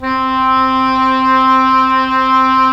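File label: Cor Anglais; Roland - LA Composer vol. 4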